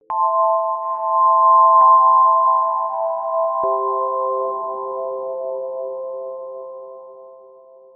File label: desktop logout